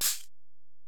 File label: Ac Perc, Drum Machines Alesis DM-PRO